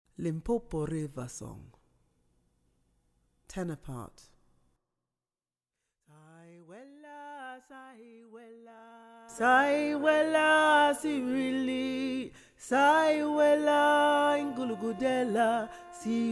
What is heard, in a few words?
Tenor, gospel choir; Limpopo River Song